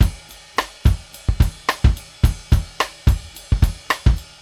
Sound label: RIDE FUNK; Sampleheads - New York City Drumworks VOL-1